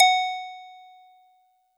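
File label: Marimba DX100 F#6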